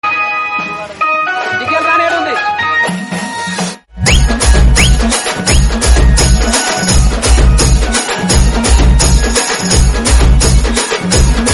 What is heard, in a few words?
chatal band, teenmaar, hyderabadi